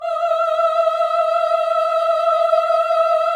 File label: VIB F E5A -L, Symphony of Voices; Spectrasonics